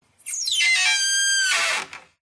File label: Wood Stress Creak Squeaks; DPA4060; pmsfx